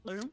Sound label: cartoonz gulp noise; taunts; props